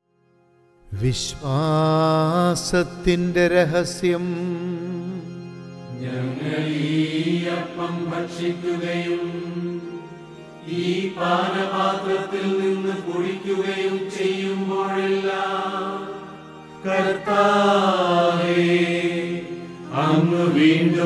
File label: Chant; ordo cantus